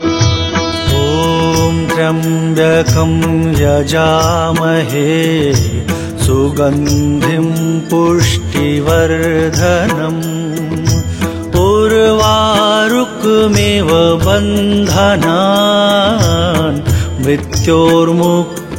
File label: Maha Mrityunjay Jaap, Bhakti